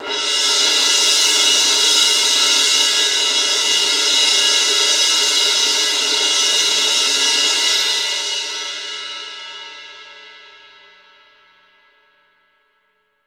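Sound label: C18 TRM F, tremolo; Best Service; Peter Siedlaczek's Advanced Orchestra; C18 TRMOL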